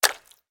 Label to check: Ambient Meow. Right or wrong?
Wrong